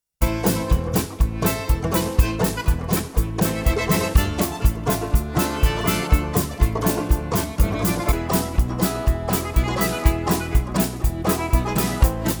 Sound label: accordeon banjo march